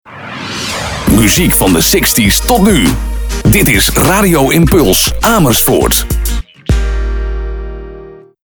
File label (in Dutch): Jingle Muziek